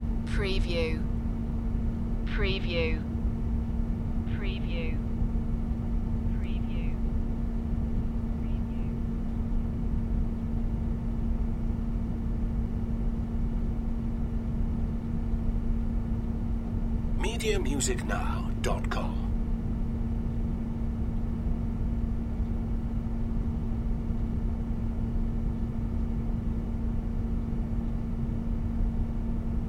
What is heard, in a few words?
boiler room ambience